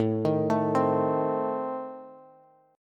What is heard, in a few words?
A Diminished A down Bass; Adim arpeggiated; JGuitar